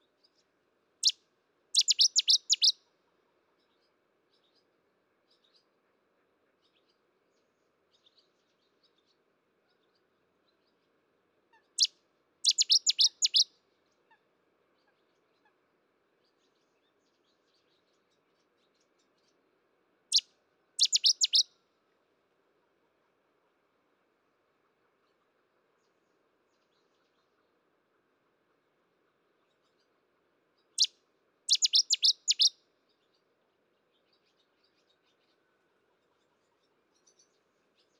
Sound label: dawn